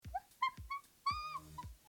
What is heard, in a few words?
squeak laugh